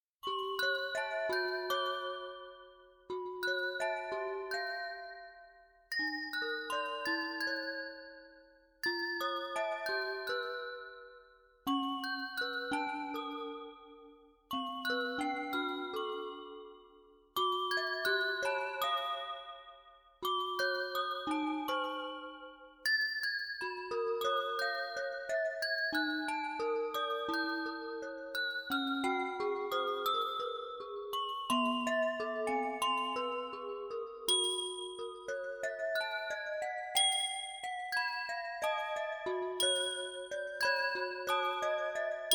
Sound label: Bells